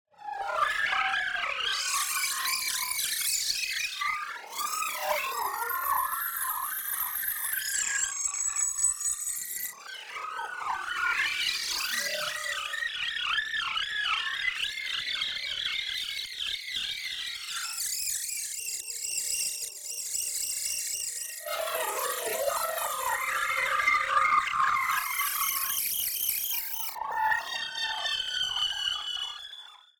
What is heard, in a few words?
TEXTURE Scream Show; boom library; Neon